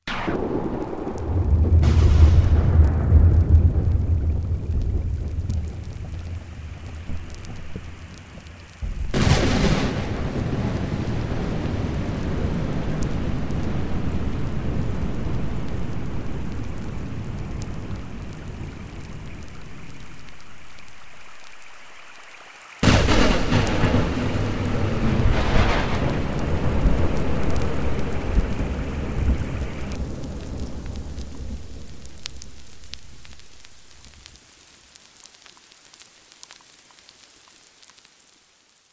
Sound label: thunder